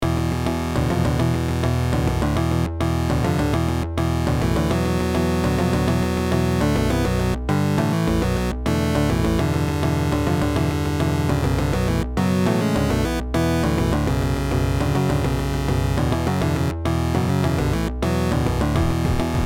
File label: Boss Intro; Dead Guild